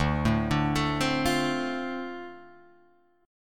D-Minor 7th D Guitar Open G, chord

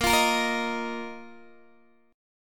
A sharp Suspended 4th A sharp down Guitar Open D

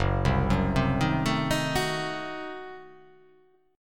F sharp Diminished 7th F sharp

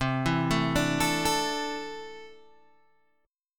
C 6th Add 9th C down Guitar Open G

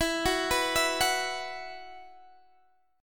E Suspended 2nd E, Guitar, DADGAD

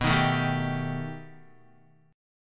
B Augmented 7th B down Guitar; B+7; strummed; JGuitar

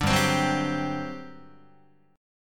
G Major 7th Suspended 4th, Guitar; GM7sus4; strummed

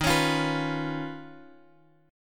D sharp 7th Suspended 2nd Sharp 5th Guitar DADGAD

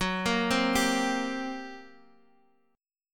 G Minor Major 7th Double Flat 5th, Gb

